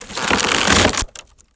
objects, World of Tanks

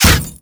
glass small meaty

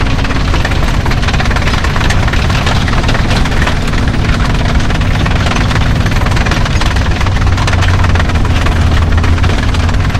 maus tracks, tanks; War Thunder

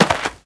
foot dirt, footsteps

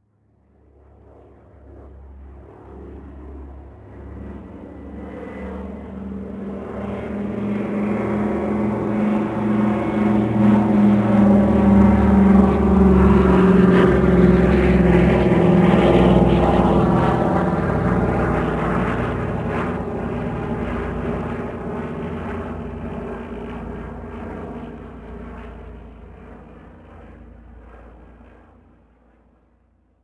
aircraft; ambient; World of Tanks Blitz

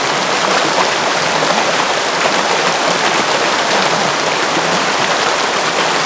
waterfall gurgle close

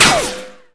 sheetmetal small

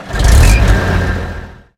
tiger diesel engine load, engines tanks; War Thunder

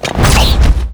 pull in

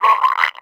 grunt die

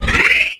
dragon shocked

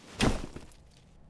Drop Sack; Civ4 warlords

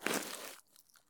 jump asphalt; Escape from Tarkov

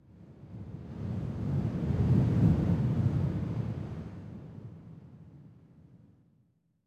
wind buffet; ModernWarfare

